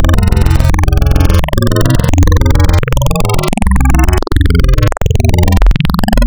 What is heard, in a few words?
Spectral, wavetables; Architecture 32